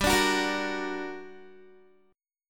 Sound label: G Minor Major 7th, down, Guitar; GmM7; strummed